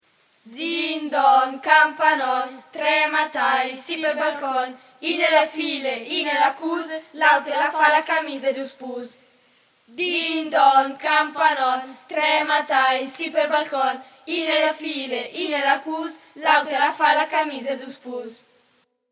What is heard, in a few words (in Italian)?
DIN DON CAMPANON